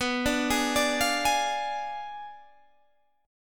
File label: B Diminished 7th B down Guitar Open G